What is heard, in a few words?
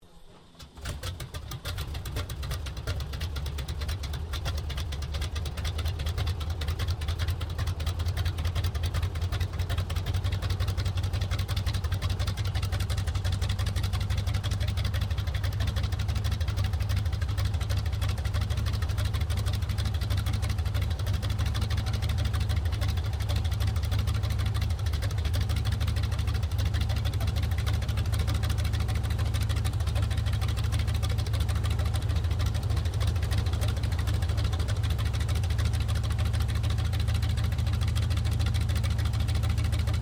AIRCRAFT CAC WIRRAWAY STARTUP STEREO; CA-16 Wirraway; Airplane; Vehicles; Aircraft Collection